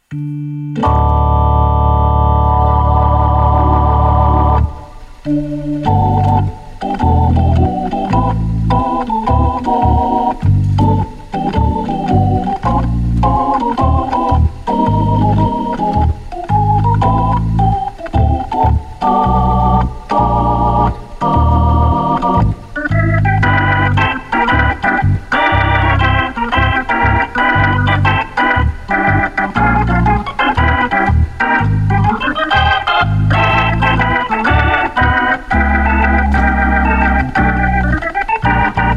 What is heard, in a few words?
3300w Pop, hammond organ